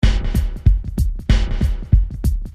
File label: Peppy Driver 95